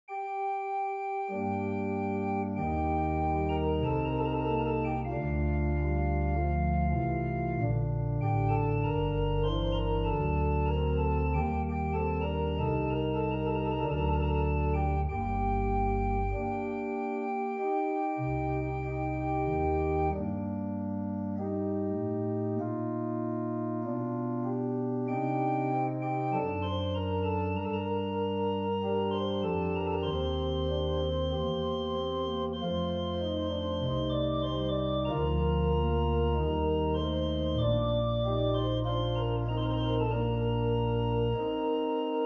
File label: Wet, organ